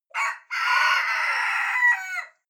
cock call hoarse distant

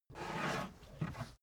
carriage hatch slide

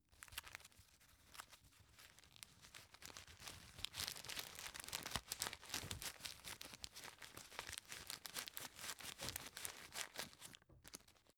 tissue stuff in package

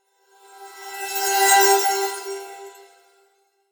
sweep

